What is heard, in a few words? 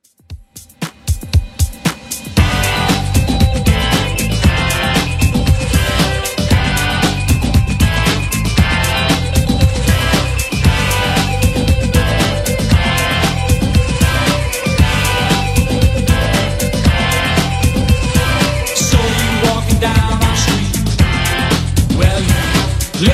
90s Redrum 116bpm